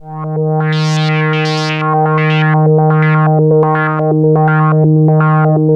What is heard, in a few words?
ARP 2600, Vintage Keyboards; Big Fish Audio; First Call Vintage Keyboards